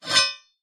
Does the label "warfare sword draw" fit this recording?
Yes